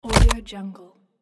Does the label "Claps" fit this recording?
No